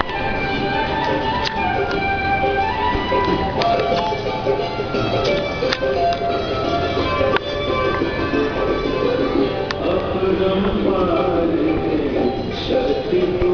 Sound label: Hindu Temple Old Delhi